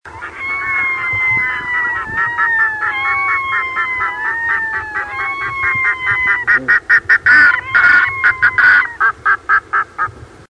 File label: wail quack, loon